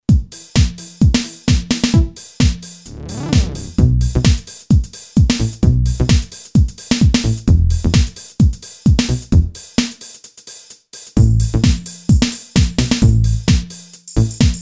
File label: HOUSE, Boss DR-3